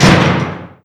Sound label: bunker door close; gesource; doors; bunker classic